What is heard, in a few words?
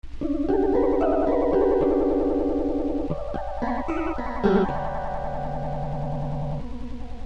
Chorus, guitar; guitar - effect; Clips recorded by A. Colman; proto guitar - Bulldog Cab Sim - sound card